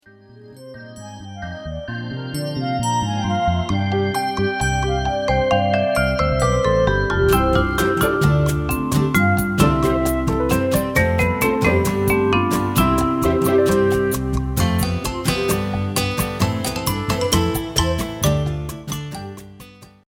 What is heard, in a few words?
Instrumental